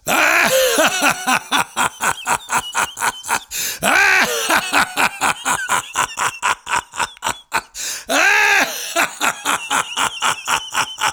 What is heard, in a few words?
evil laugh